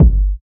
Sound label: steel kick drum sound G key